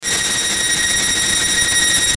GLITCH ELECTRI, Glitch Electrical Static High Frequency Chopper; glitches; Blastwave